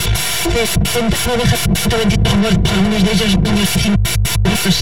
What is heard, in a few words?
Synthetic Radio Tune